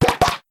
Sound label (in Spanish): Grito de Crabrawler